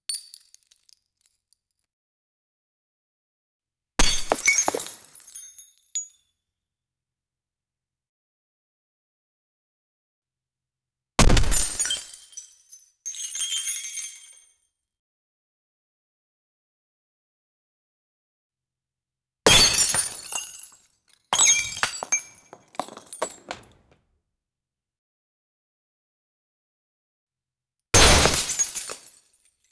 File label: entity screaming and brea, breaking-glass